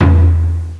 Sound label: Gunshot; Castles - Siege and Conquest